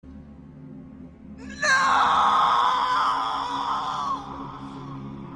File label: nooo; frodo